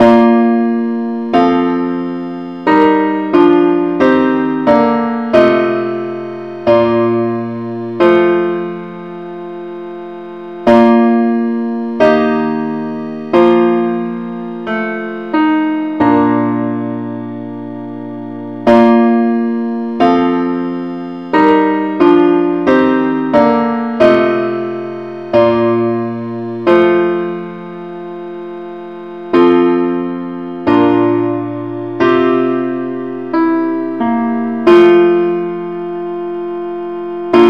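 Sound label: parts only key B flat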